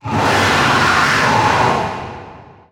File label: roar distant; nargacuga